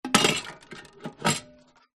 household bread maker panasonic sd 2501 tin place in